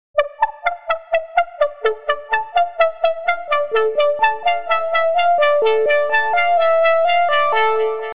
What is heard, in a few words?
PLUCK Beauty